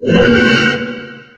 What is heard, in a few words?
mpoltergeist idle; STALKER; customnpcs